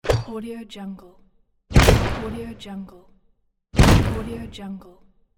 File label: hand mortar; game; ضربه کشنده